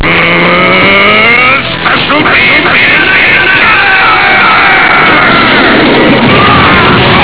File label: cannon; Piccolo doing his Special Beam Cannon